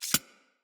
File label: Arachnoid Micro Step; Corpus